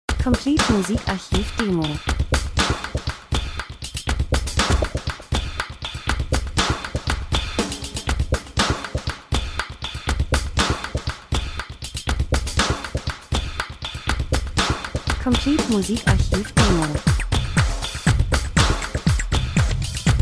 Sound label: drums; Moderater Elektro Rhythmus, Gleichmäßig, Stampfend, Laufband, Maschine, Industrie, Bassdrum Snarre